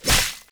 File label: sword cuts flesh